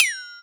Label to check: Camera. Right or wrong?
Wrong